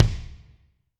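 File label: Kick Deep Room, Alesis D4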